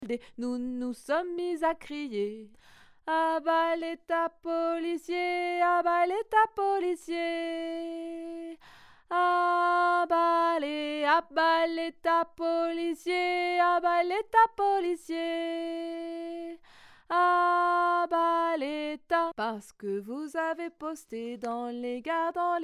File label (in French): harmo aigue